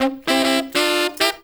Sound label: SAX, W. AFR.; Spectrasonics; Heart of Africa